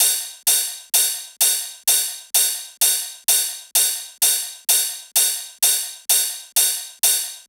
Bigroom Kit F 128bpm Ride, Drums; EDM Drums; Drum Kits; Stems; Ghosthack; Ultimate EDM Drums; BigRoom Kit 02

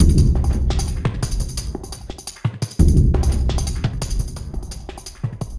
Percussion Loop 172 Minimalism; Drums & Percs; Percussion Loops; Ghosthack

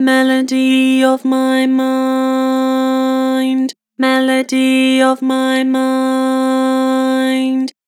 Vocals Flat Melody of my mind; Harmor

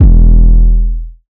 808 - E; 808s; Cymatics; Cobra Hip Hop Sample Pack

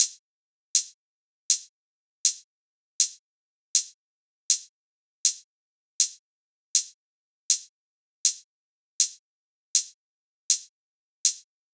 Hip Hop 80 Hat; Drums; Drum Construction Kits; Loops; Ghosthack; Drum Mega Bundle; Drum Hero 5; Kit Hip Hop 1

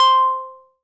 Juno 106 PLUCK Cute High - C; Synth; One Shots; Cymatics; Fantasy Synth Sample Pack

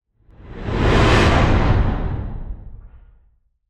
Whoosh; Whooshes

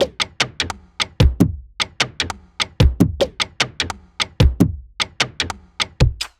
Perc Loop 150; Drums; Percussions; Loops; Pandemonium; Ghosthack; Drum Mega Bundle; Abstract Percussions Volume 4

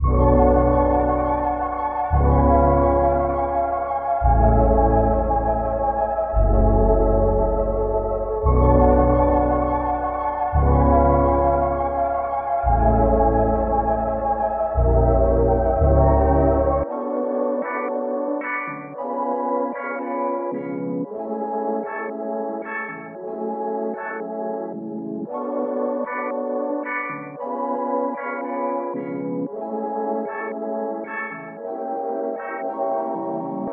114 BPM F# Min, Vintage & RnB Melodies; Sensualism; Cymatics

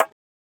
Perc - Knock; Drum One Shots

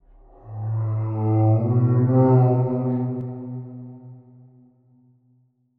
130bpm Am, Vocalpack

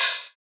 OH; Hats and Cymbals

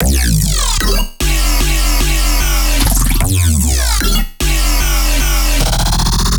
Bass Loop, Dubstep, 150bpm; X Invasion; Ghosthack; Dubstep Sound Arsenal

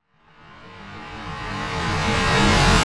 Riser

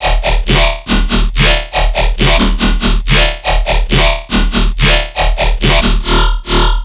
Riddim Bass Loop D# 140; Calibrate; Ghosthack; Riddim Essentials Volume 2

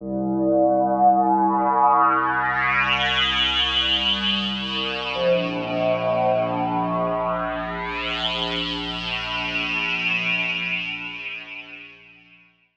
Pad; Dubstep

